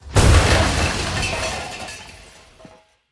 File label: Accident Truck